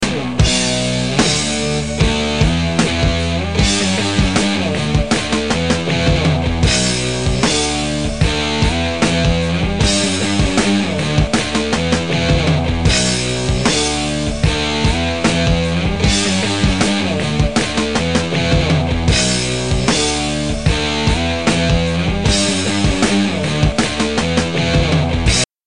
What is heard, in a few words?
Theme Ringtone